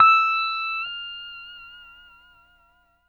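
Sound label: pedal steel bend up E6, Right; PEDSTL BENDS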